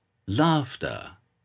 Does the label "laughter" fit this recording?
Yes